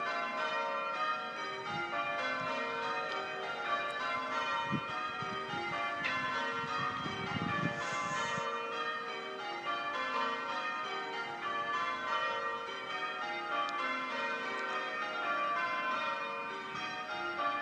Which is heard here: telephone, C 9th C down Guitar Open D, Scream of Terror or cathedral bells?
cathedral bells